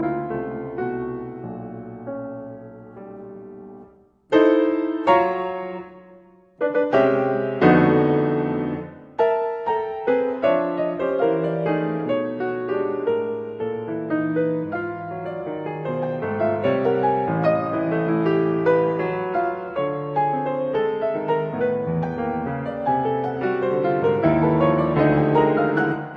version for piano, Moderato appassionato; Evocations

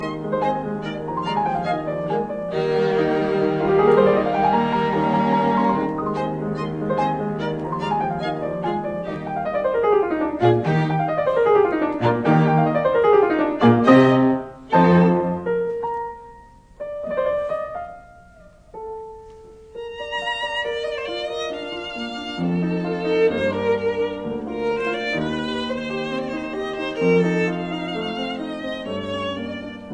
Piano Quartet Allegro; in E flat major; Mozart; K 493

